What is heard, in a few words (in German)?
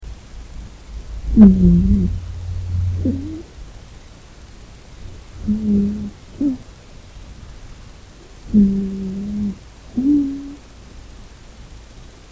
schnarchen